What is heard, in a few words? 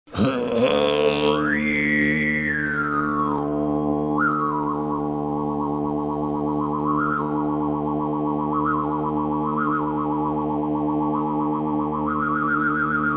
chylandyk borbangnadyr